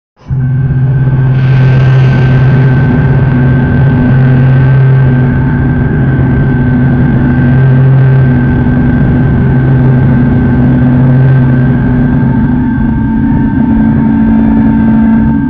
a car engine sound

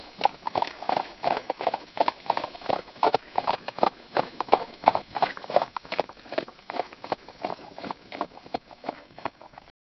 ultra realistic sound of, horses

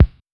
lofi vinyl record sampled

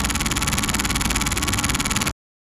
seamless loop 110 bpm, rhythmic; 3s

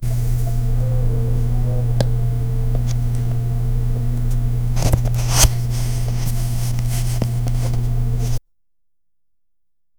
card played; 1 second

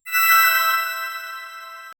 a soft futuristic os star, crystal chime

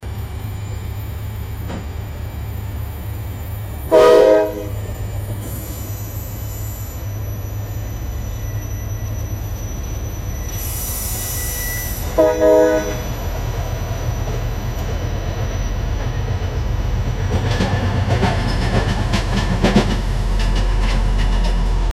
indian train slowing down; braking

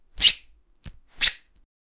squeeze hands inside boxi, boxing

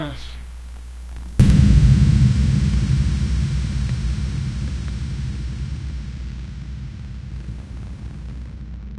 dark room low hum fiery; dark ambient

